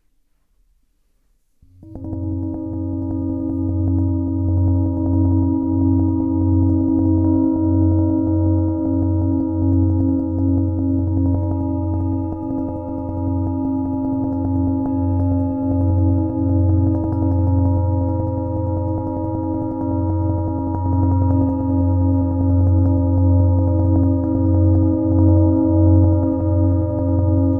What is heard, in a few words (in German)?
Glockenpein